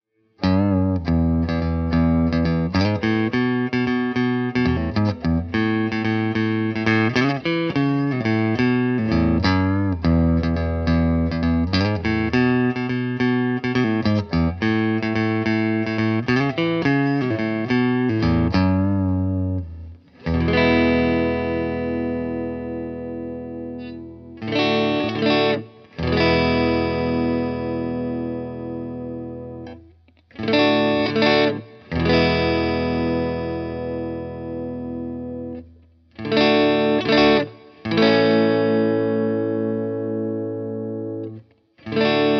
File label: Neck, pickups